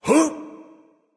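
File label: nonverbal surprise; smite; voicepack; ullr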